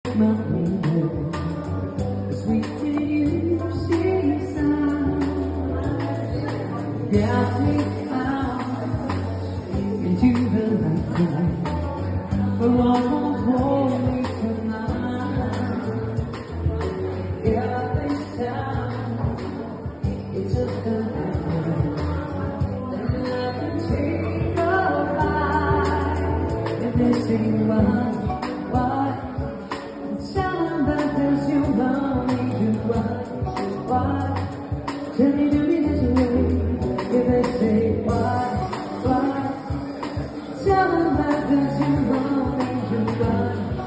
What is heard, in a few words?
Pop Lounge